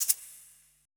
E SHAKER, ETH PERC

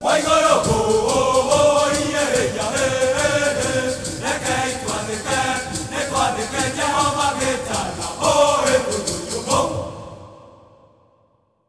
Heart of Africa; Spectrasonics; Heart of Africa 2

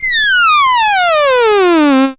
syn c, Dance Synths, Acid Bubble; ProSamples vol.37; Best Service

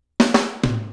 Dancehall 100, Intro, Full Mix, Drums; Sonic Foundry (Sony Creative Software); Drums from the Big Room The Mixes; Demo Projects

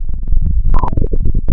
ARP ODISSEY, wavetables

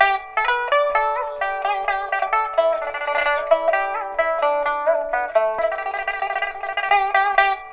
PIPA, 90; INIS - Opium

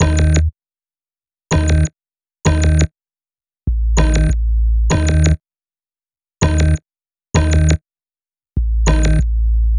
Bass, Hip-Hop

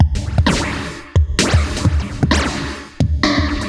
Moog Phaser HR-16B (130BPM), cyborg beats

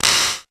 VERB CLAP, Techno Trance; E-MU Formula 4000 Series Vol. 2; OrbitPercMaster

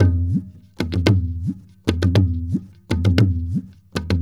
INDIA L; Spectrasonics - Heart of Asia CD2

